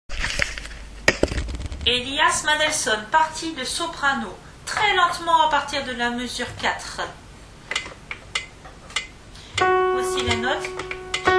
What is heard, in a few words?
piano